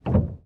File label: piano release; samples-piano